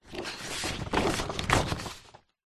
hospital xray sheet place slide into envelope

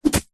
spear land ground; zapsplat

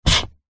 car brake pedal press down with foot engine off; vehicles; zapsplat

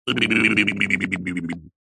cartoon voice grumpy head shake or lip wobble

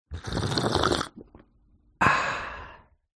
Sipping Coffee

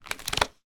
household small photo album pages flick through

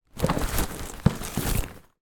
foley gift present wrapped box remove from bag

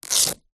foley sneaker childs velcro pull; zapsplat

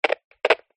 technology CB radio short static click

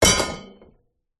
impacts metal pole small hit drop on metal rack

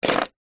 industrial metal hacksaw junior down on surface

